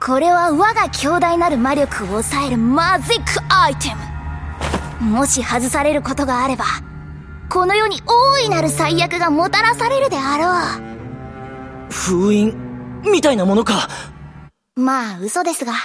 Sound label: applause; osu!